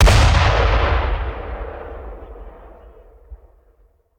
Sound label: Mortar Cannon; Sound Ideas; Guns